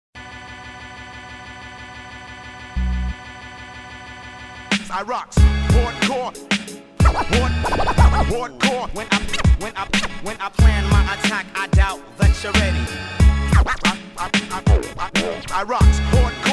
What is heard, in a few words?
Hardcore HipHop